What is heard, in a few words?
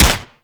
Infested Swarm Rifle 2D Shot; Shock Swarm Rifle; Weapons